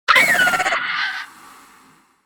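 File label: Dilophosaurus sound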